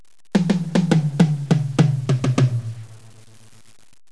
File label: tomtom